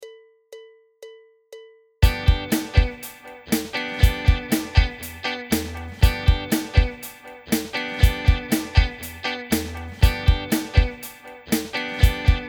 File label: impro g7